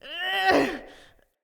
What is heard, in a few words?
Grabs Acorn Vocals Female; Bromius; Wazard; Envoy